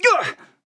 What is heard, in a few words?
Grunt Hit; ShaLin